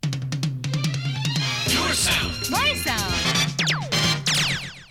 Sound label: topformat maxi jingle single